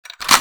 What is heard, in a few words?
rainbowgun after reload